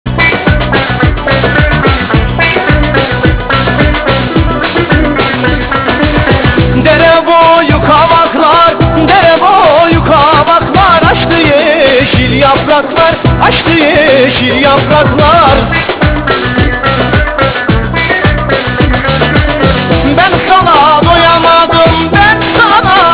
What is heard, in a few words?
music